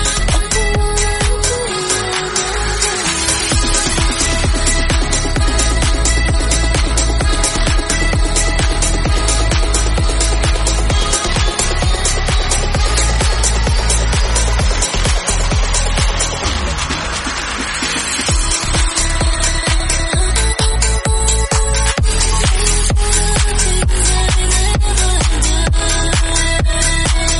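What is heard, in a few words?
130bpm D flat minor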